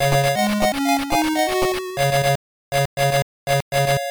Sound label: End Level; Asterix; Master System